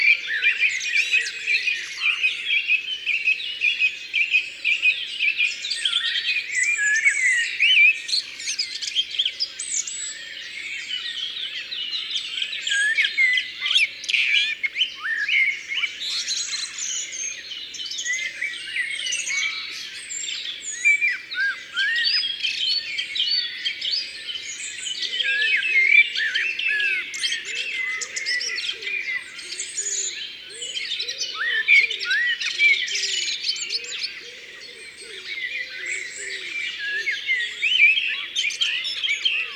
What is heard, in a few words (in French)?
Grive Musicienne, Ardeche; Concerts Naturels De l'Ardeche